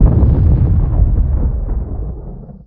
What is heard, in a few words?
interior explosion distant